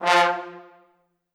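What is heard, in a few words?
Trombone Ensemble staccato F2; VSCO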